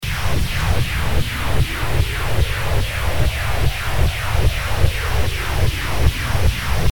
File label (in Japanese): 気を溜める